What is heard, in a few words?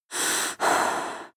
breath female deep